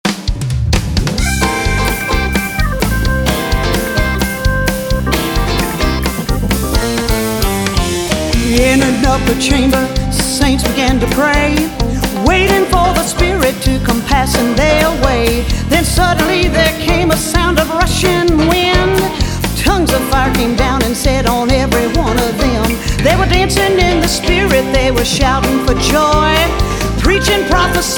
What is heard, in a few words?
spring rain